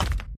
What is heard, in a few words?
symbol single land; The Golden City